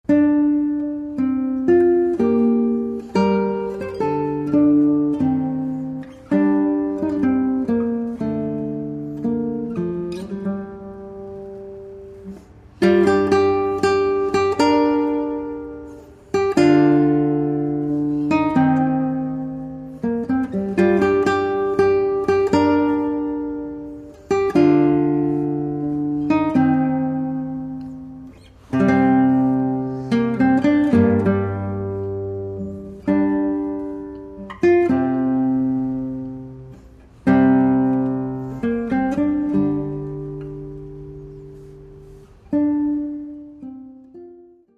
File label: Desert Drum